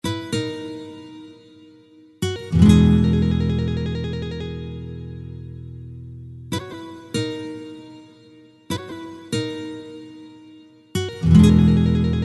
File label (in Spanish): Doblan Campanas Rondena live; flamenco